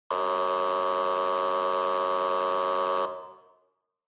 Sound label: Police Car Siren Close Buzzer